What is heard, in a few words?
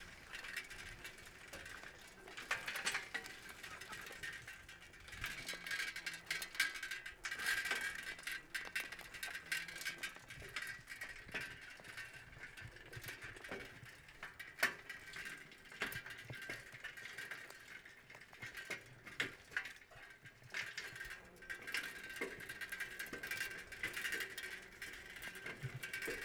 Bicycle Pedal Steady; Vehicles; Bikes & Motorcycles